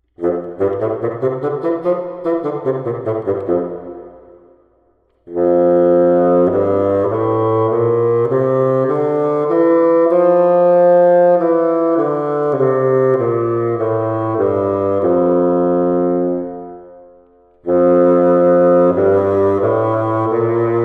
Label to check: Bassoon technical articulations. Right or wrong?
Right